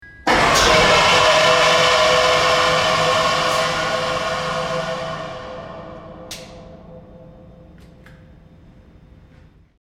Shock Fire Warning B

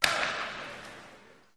Ice Hockey Hits Impac

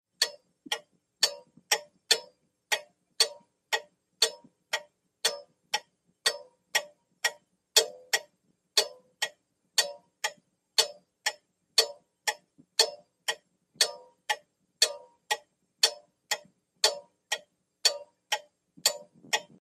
Grandfather Clock Tick

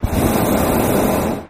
Hot Air Bllon Burn Bu, Hot Air Balloon Burner

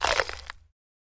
Corn Cob Bite

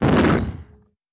Rock falling ( cartoony ); rocks

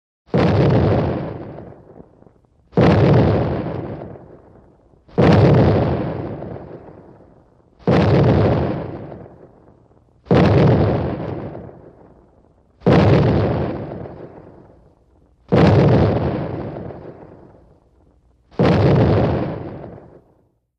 Cannon Fire Mult Roun, modern artillery exterior

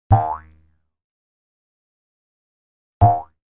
Thumpy Boing Thump; boings / twangs / snaps

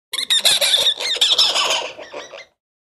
Monkey Spider Chirp S

